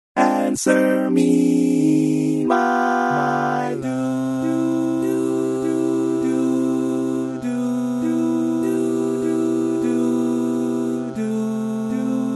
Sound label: Bari, barbershop tags